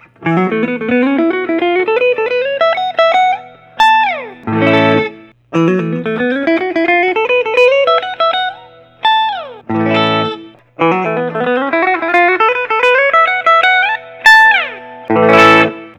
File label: artinger semi hollow trem; root bee